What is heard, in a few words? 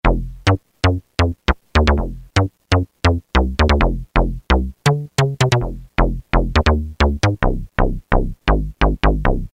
Sharp Rezo; Roland M-DC1